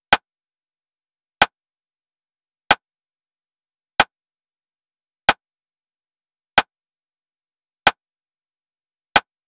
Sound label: Drum Loop - 93 BPM Rim, RnB; Barely; Cymatics; ANALOG EVOLUTION Beta